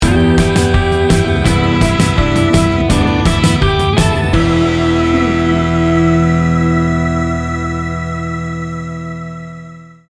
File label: swamp monster sting bumper